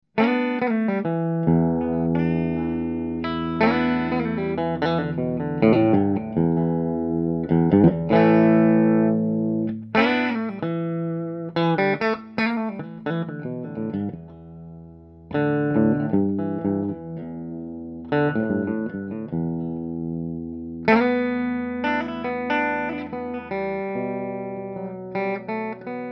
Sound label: blues